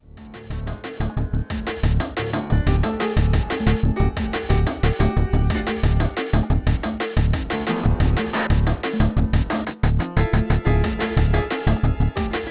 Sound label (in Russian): zhestkyi takoy pianist 8bit